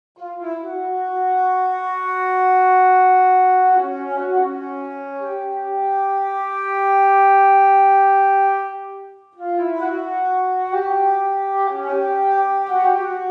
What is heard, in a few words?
flute passage; sampled woodwind instruments; Berlin Woodwinds; Orchestral Tools